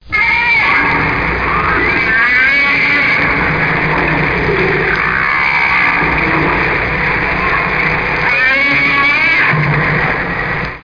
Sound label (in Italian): Balena